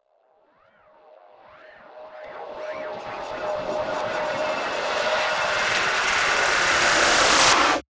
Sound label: EFX